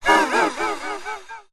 horror monster creature evil wheezy laugh exhale

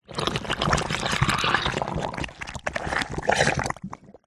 cartoon slime gooey mess movements; zapsplat

